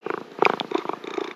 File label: Pet Cat Purr; creatures pets; Lego